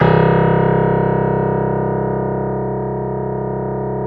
piano C0; AKAI S-Series CD-ROM Sound Library VOL-8

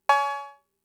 Cowbell; Fricke MFB-522

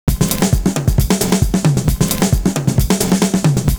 toms n sn

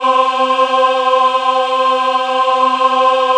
C4 MW AH, Choir; USB Soundscan vol.28; Acoustic & Synth; AKAI

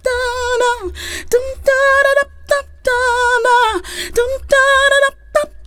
FEMALE VOX, Hip Hop

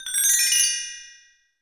Bells Chimes, PRC BTREE; NorthStar - Global Instruments VOL-2

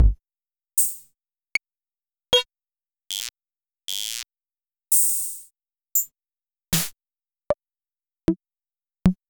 Dr Böhm S-78

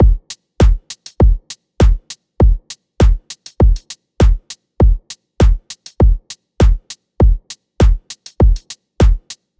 EDM Drum Loop 100 BPM; 99Sounds x Monosounds; Dance

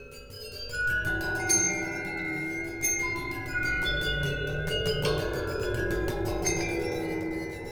Waterphone Bounce Bow; Metal; Sonic Foundry (Sony Creative Software) - Ambient Atmospheres & Rhythms; Ambient-Metals

